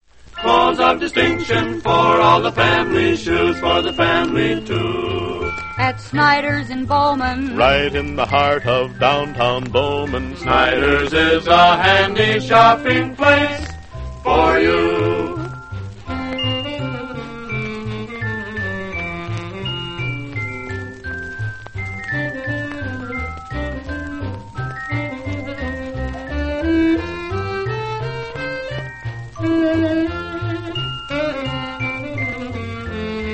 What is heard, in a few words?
radio jingles; snyders; allied radio artists